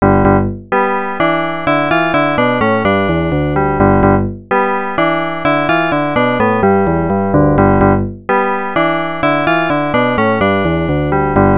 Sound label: arcade main; elevator action